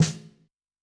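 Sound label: Snare Ghost; Yamaha DTXpress-II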